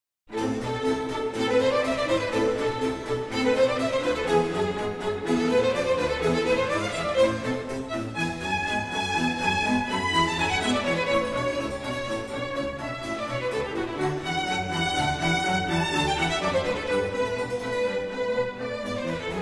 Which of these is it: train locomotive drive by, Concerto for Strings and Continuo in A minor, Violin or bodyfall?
Concerto for Strings and Continuo in A minor